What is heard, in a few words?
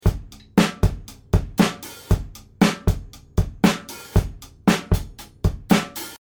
Drums COMP3A Jr TIME A Red 5 Gain 5 HPF